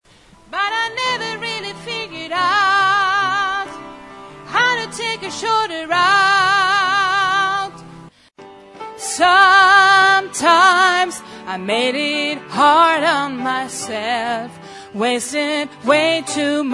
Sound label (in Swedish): alt, stammor